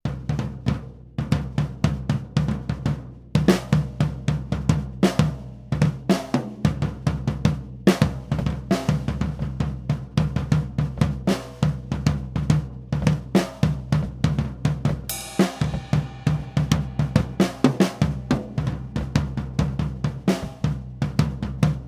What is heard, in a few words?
drum play music; The Sims 2